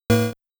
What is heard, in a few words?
Bell Drop